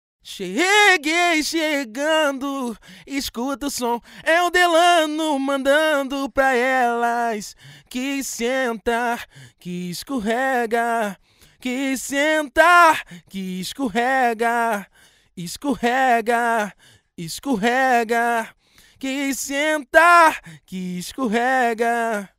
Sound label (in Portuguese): acapella